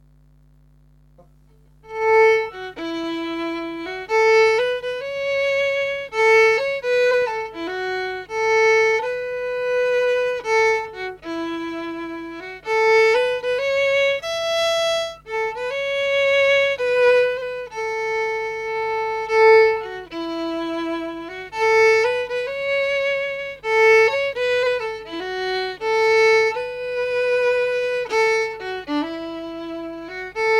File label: waltz